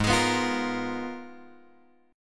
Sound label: G sharp Minor 6th Add 9th; G#m6add9 Chord {4 X 6 4 6 6} strummed